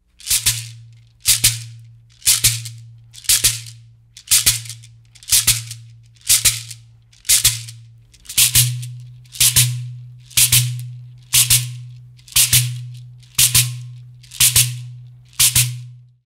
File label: Shekere